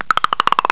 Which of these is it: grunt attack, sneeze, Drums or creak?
creak